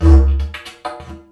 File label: Didgeridoo Kit Samples